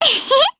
Vox Laugh jp; Estelle; kingsraid

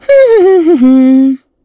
Hum; Estelle; kingsraid; voices heroes

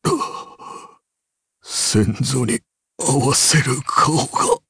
Vox Dead, jp; Dakaris; kingsraid

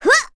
Vox Attack; en; Lilia; kingsraid

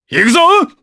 Vox Attack, jp; kingsraid

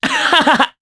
Laugh, jp; Kasel; kings raid

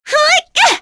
Vox Attack; kingsraid; en

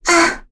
Vox Damage; Jane; kingsraid

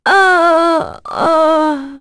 Vox Dead; Priscilla; kingsraid

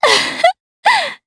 Vox Laugh jp; voices; heroes; DarkFrey; kings raid

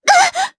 Vox Damage jp; kingsraid

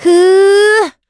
Vox Casting; Valance; kingsraid

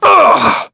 Vox Damage; kingsraid; voices heroes en

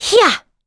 Vox Attack; kingsraid; en

